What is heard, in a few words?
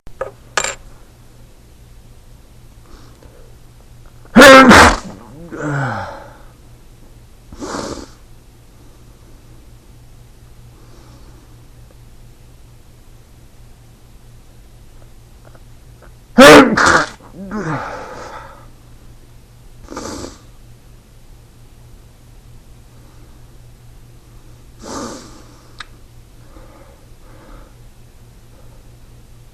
stifle, male